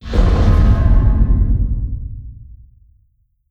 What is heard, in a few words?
distance stellarites fire beam; Stellaris; guardians